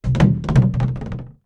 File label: industrial container large plastic empty movement hard ground; zapsplat